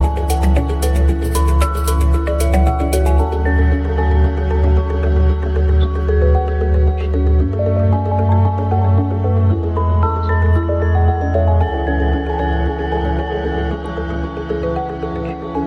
huawei mate 20 lite ringtone

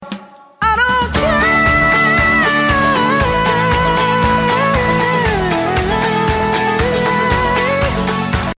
English rock tone ringtone; english ringtones

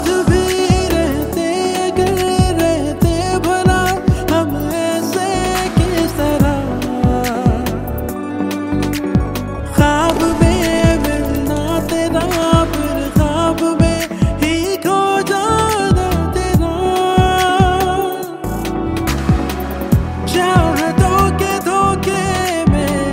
pakistani ringtone; geo drama

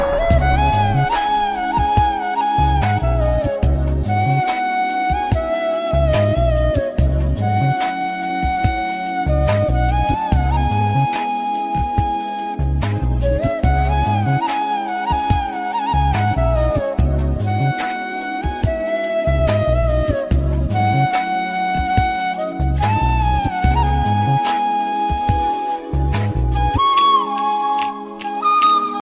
romantic ringtone; bollywood ringtones

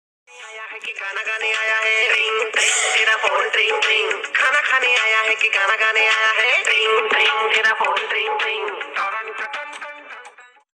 funny song ringtone

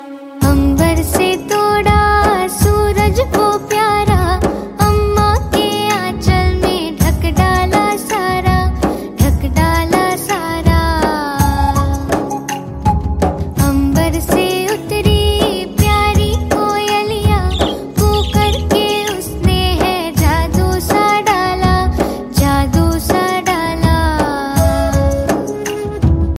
song ringtone, bollywood

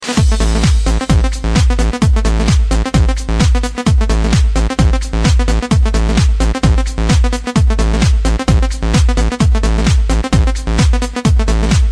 electro ringtone; electro nord

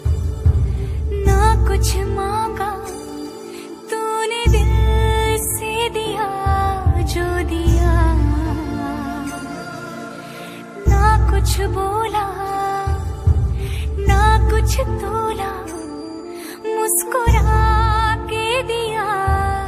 bollywood ringtone

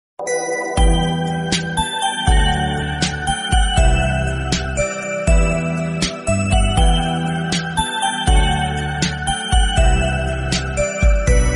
lovely romantic instrumental music tone; samsung ringtones

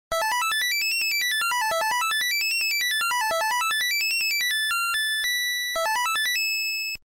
video game ringtone

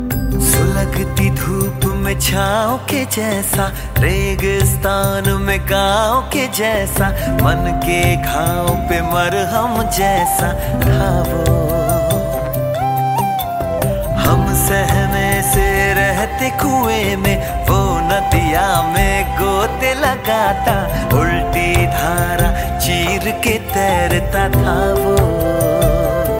hindi ringtone, bollywood

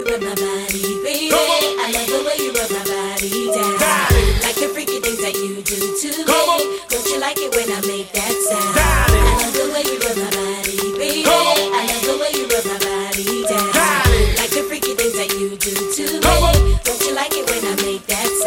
latest english song ringtone

